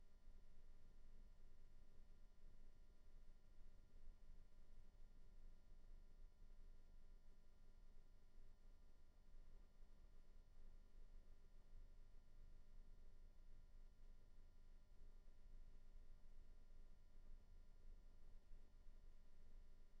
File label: house night